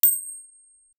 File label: coin clink sound short me; collect